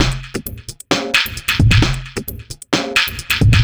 Processed Drumloop

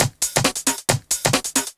Drums Techno

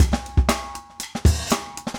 break 120

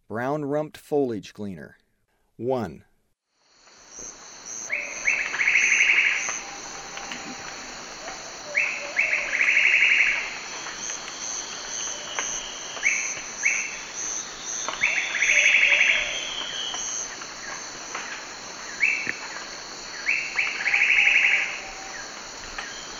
Brown-rumped Foliage-gleaner; S. America; Eastern Lowlands

